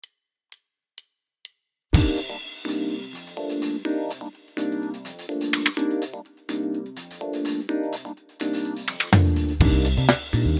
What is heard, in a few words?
DRUM DANCE